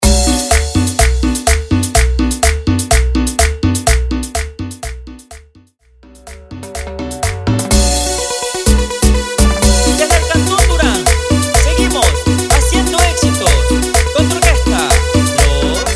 Mix San Juanitos, Intro Simple, 125 Bpm

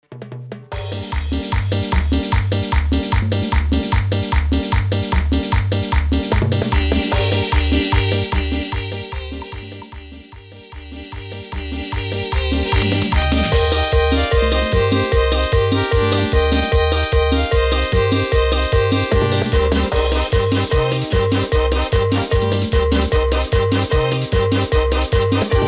Instrumental

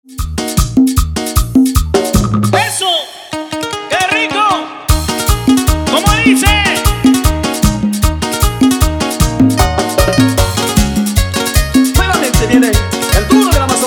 COVER BPM 153; DIMAX DJ MIX

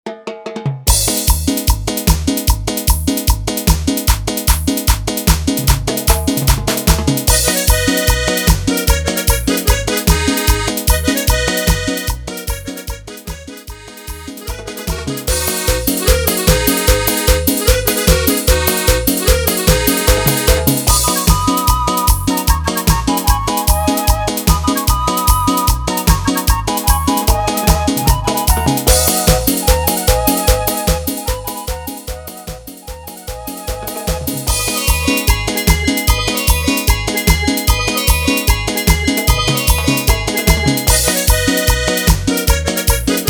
Instrumental chicha; BPM 150

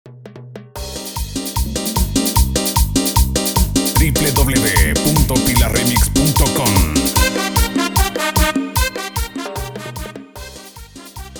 Instrumental Acapella, 150 BPM

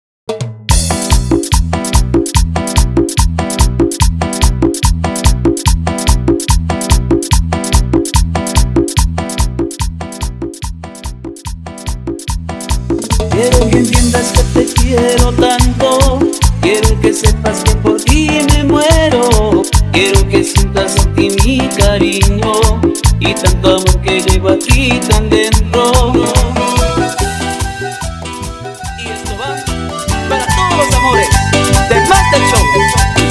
Intro Acapela Bpm 145

